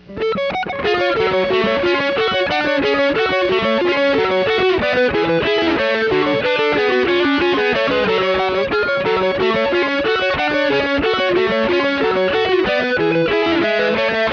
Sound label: music; tetris